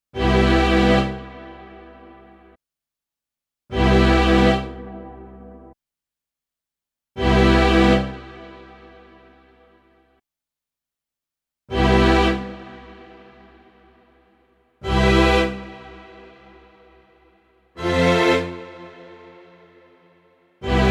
Nord Piano 5 Strings Reverb Dark Bright Chorale

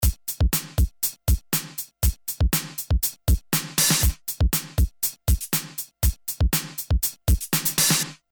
Audified Synergy R1 Drum Loop saturator germanium

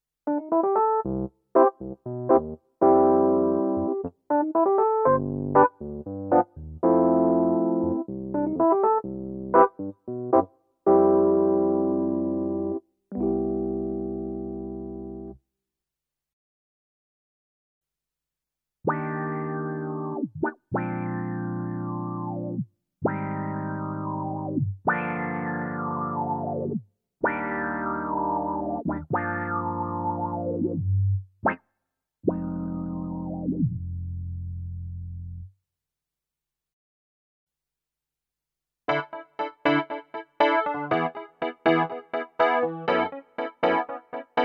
EPiano

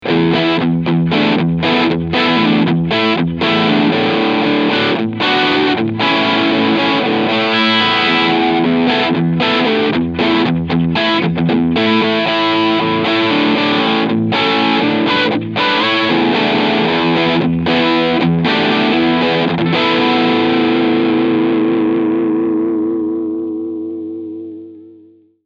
Keeley DDR Overdrive Pur

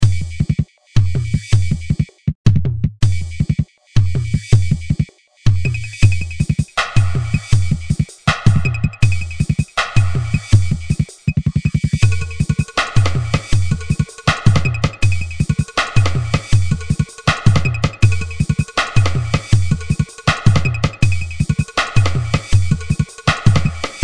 Drum step

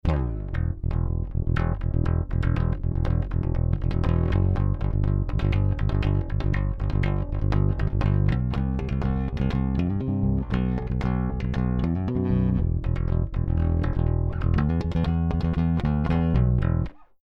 Fender P Bass Galopp

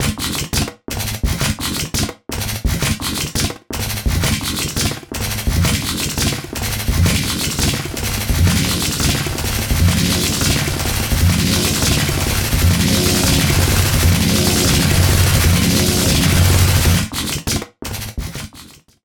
Synplant 2 Amen DNA Release Sweep; Soniccharge

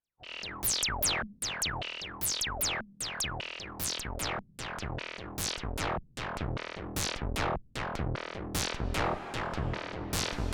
Moog DFAM Specular Tempus

